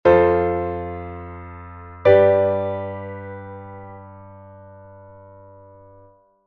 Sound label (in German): f dur g dur; wie schreibe ich einen song